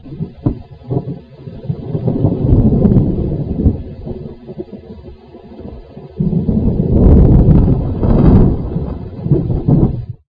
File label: storm